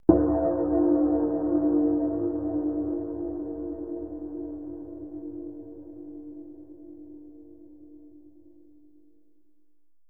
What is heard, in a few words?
PERC MIX, Retro Sampler